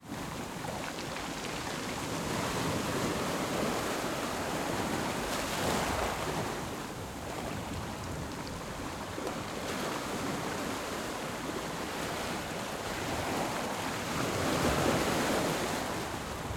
Mid waves stone beach near field, Mediterranean sea; AKG